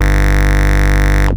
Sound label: bass